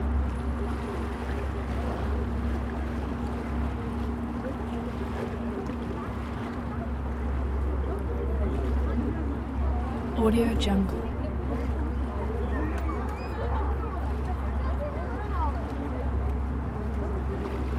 ambiance strait bosphorus shore loop

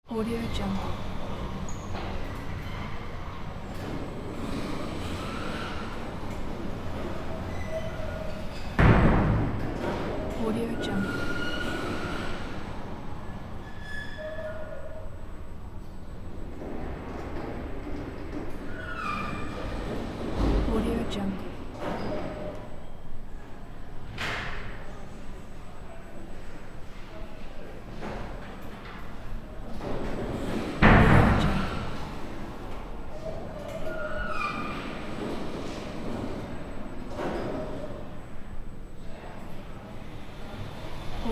building with old lifts ambience; circutreeaudio

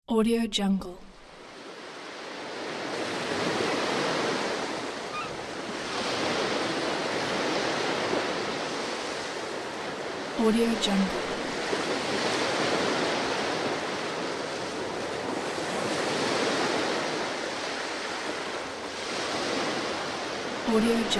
sea shore

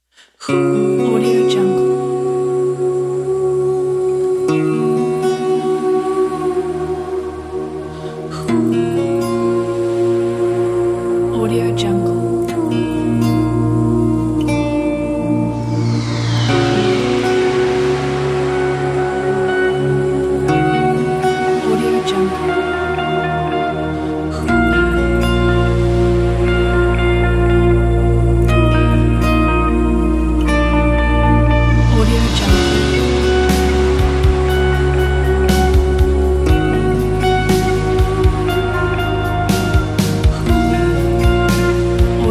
space waves

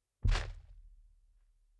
dinosaur footsteps boomy thumps

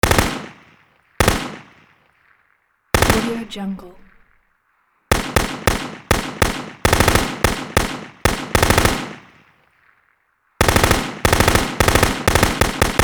ak47 machine gun single shots and rapid fire; prosoundfx

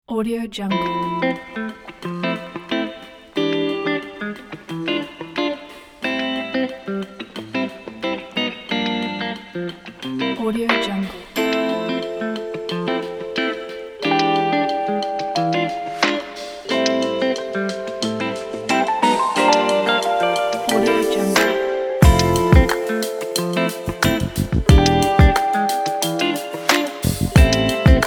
neo soul hiphop music pack

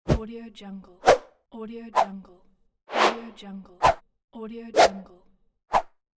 swish; swish pack

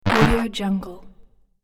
8bit pixel explosion

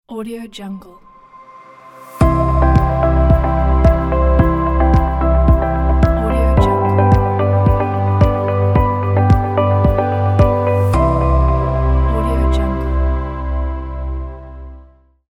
corporate logo

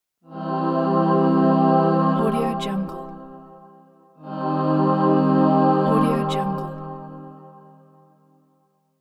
female choir

